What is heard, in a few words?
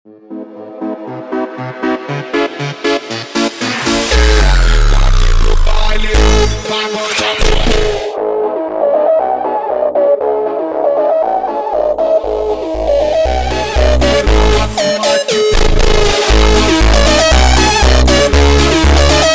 Funk, slowed